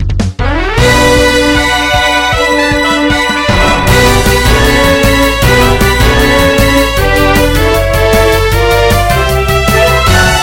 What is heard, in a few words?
Victory Fanfare